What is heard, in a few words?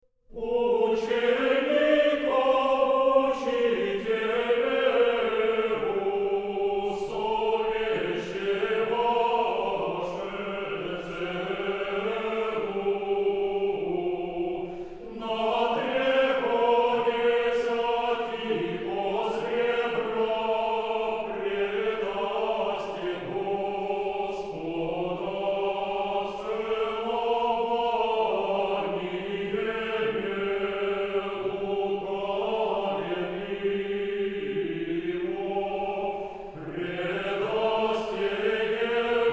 Choir; State Capella Choir of St. Petersburg; Reading the Gospel